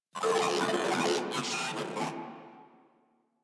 Moa Vocal Attack; Enemies; Warframe

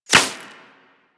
Laser Impact Default; Warframe; Weapons

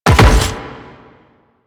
Land Metal, Footsteps; Warframe; The New War; Kahl; Quests

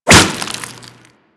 Infested Whip Impact Default

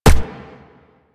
Shot Fire; Warframe; Afuris

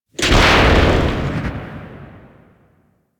Elevator Start; Warframe; Ambience; Corpus Gas City; Gameplay Remaster

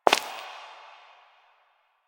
Step Rock; Warframe; Koumei

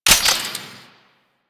Spark Rifle Mech; Grineer

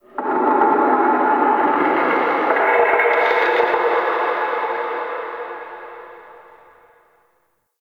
Under City Antique Rattle Distant

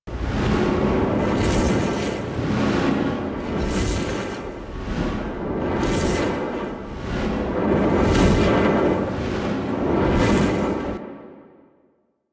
Corpus Gas City Reactor Spin Loop, Ambience; Warframe; Gameplay Remaster

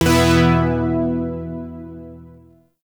Shawzin Chromatic Chord; Zariman Shawzin

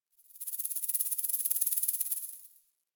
Forest Creature Rattle High; Ambience; Warframe; Grineer Forest

